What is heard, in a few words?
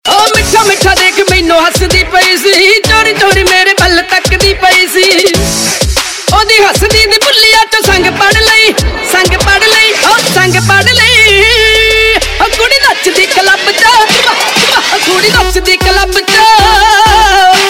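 Ringtone, Punjabi Pop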